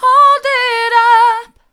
hold it up mid, vocal

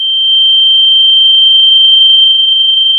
Sound of a tea kettle whistling

